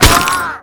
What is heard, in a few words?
neck break